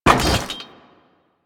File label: Generic Ledge Grab; Warframe